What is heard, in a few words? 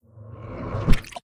Dead Monk Heavy Attack; Blind Quest - The Frost Demon